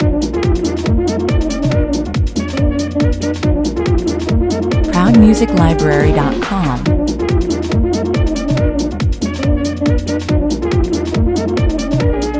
shuffle kerfuffle (loop)

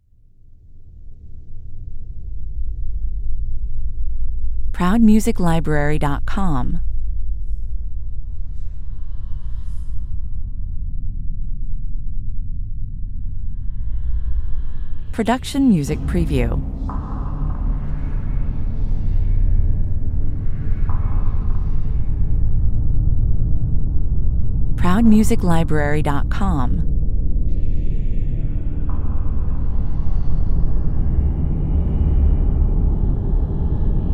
tension 80 bpm

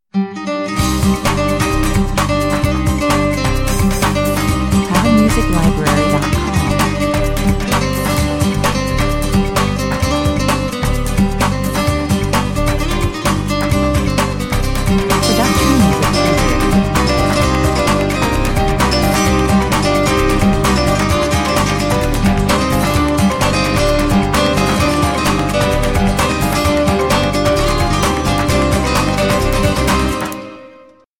flamenco, 87 bpm

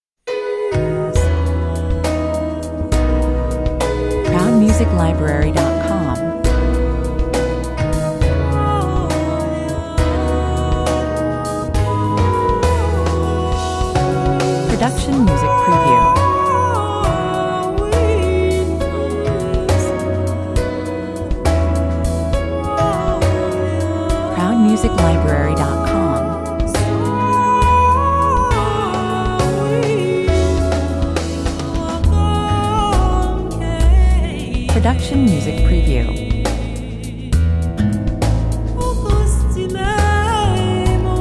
commercial

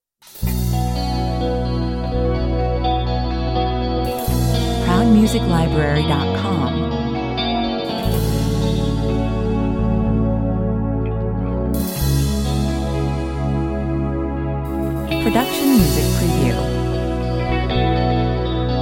powerful, intense and epic rock track, 124 bpm

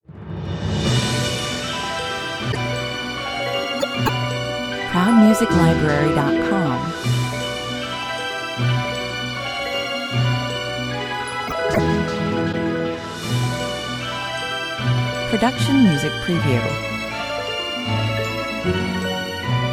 cartoon magic sequence; zauberhaft

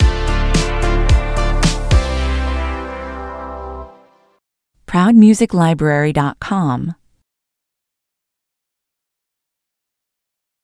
stinger

